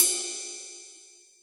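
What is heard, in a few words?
Boss DR-202 cymbal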